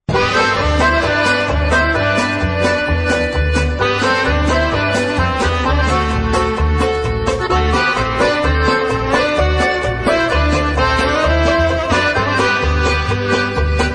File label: Broken Wine Glass Polka; Sauk Prairie